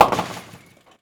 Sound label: Bowling